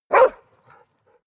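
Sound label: bark single, dogs; natur-tiere; hunde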